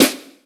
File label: sizzle acoustic snare sample C key, snare tuned to the C note. Loudest frequency: 2229Hz